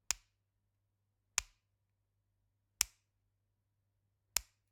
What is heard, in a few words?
Generic Click Wood Tiny; boom library; The Interface